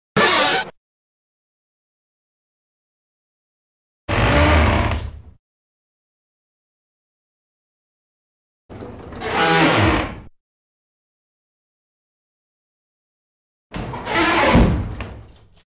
Rusty Door Hinge

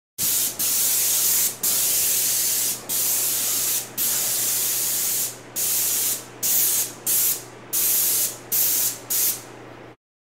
Paint Gun Short Spray

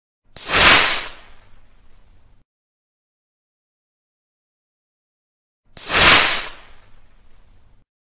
Bottle Rocket

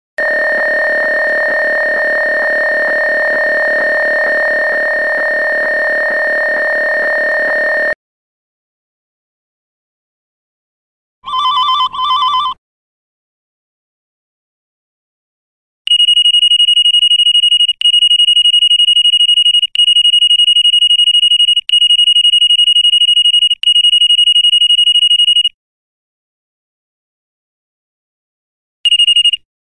Beep Electric Ring

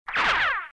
Cartoon Bullet Ricochet Noise

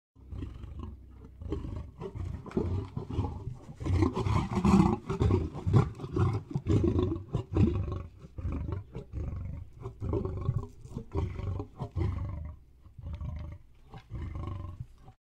Tiger Snarls

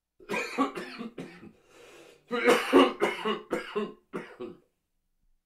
Man Coughing

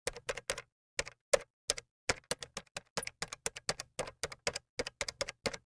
Telephone Dial Noise

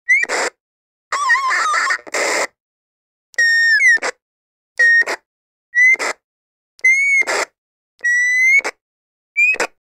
Walkie Talkie Radio Feedback Noise

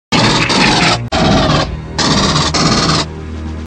Pulse Rifle; Aliens

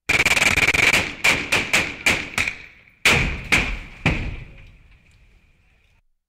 Machine Gun Burst With Return Fire

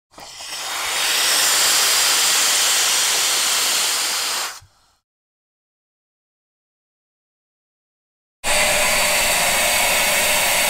Steam Machine

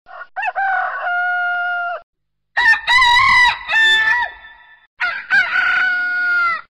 Rooster Cockerel Crowing

